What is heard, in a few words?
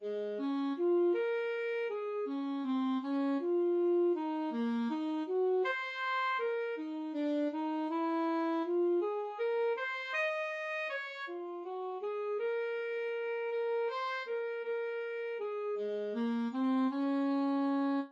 saxophone; lille ole